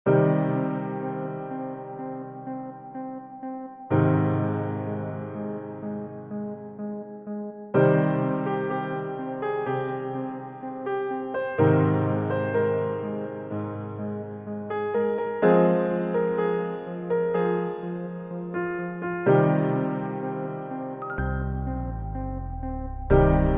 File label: Instrumental